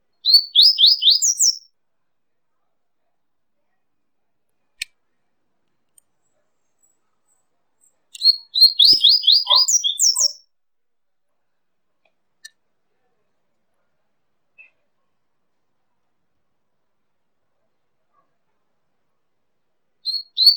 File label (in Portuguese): Canto de Coleirinho